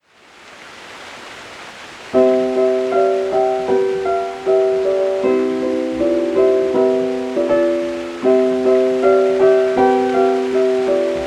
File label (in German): Klavier; Ludwig van Beethoven Symphony No.9 in D